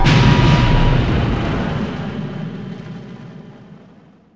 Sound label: ship hyperspace end